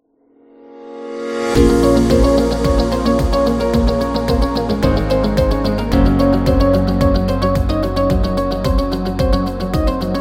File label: Motivational Corporate Music